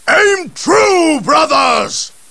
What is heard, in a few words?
cheer; gabriel angelos